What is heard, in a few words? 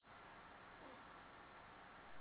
kitchen unknown